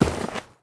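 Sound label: player snow, footsteps; csgom; cstrike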